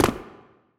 cave ground shard land; Original TK Sounds

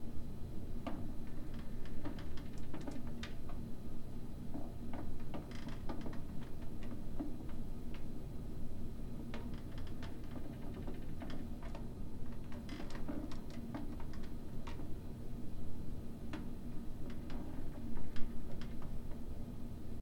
inside; Golden Crayon Sounds

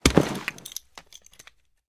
stickybomb throw; Original TK Sounds

